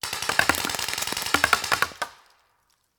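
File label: Paintball Gun Impact; Sound Ideas; Guns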